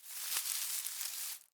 Forest Open Glade Grass Move Close, Day; Ambience

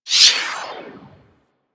Skate Stride; Warframe; Corpus Modular Crewman; Skate Movement

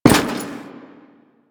Step Default; Warframe; The New War; Veso; Quests

